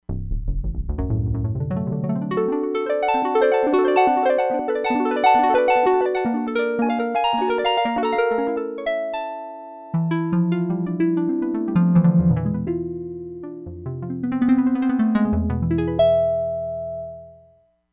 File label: HARP, Crumar Bit 99; Factory Programs